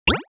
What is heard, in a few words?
Pulse gun